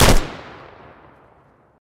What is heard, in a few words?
Scar Fire; Criminality